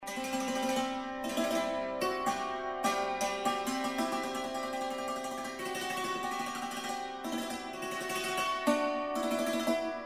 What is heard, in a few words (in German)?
Hackbrett Klang